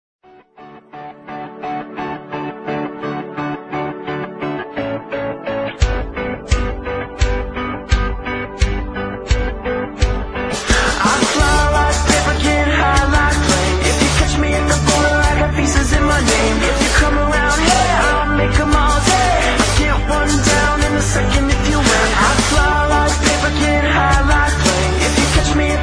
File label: cover punk